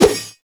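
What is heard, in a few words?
weapon miss; tm ventrilo; slender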